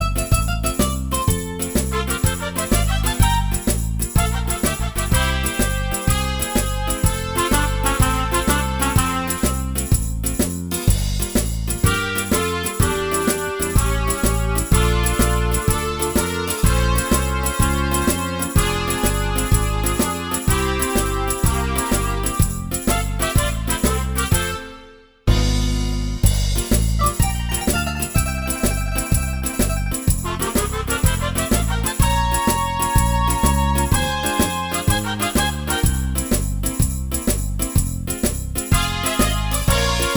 tarantella

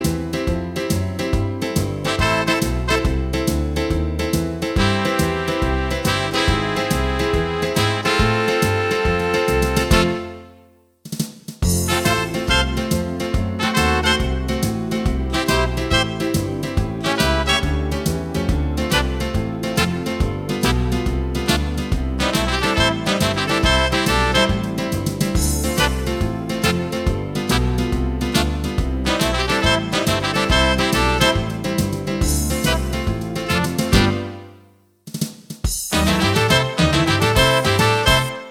swing

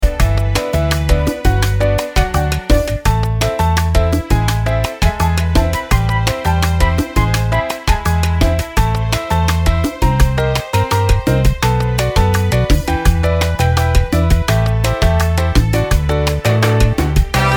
salsa

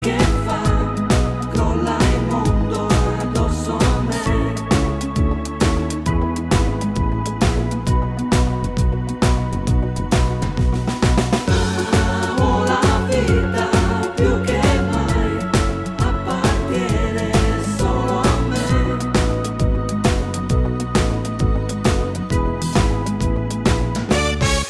hully gully

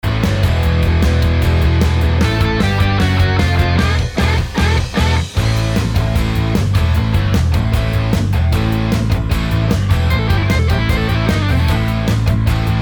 rock

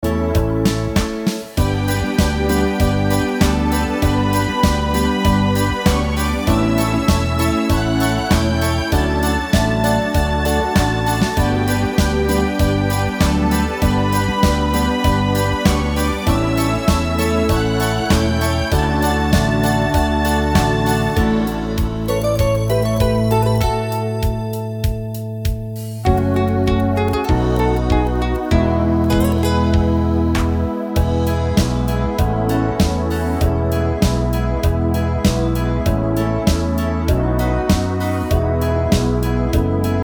cumbia

